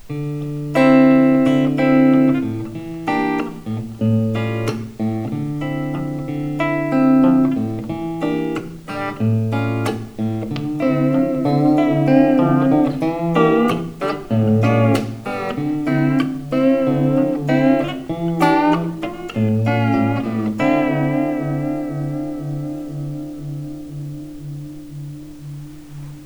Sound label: vibrate